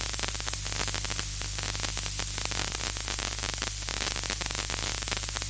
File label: Radio Static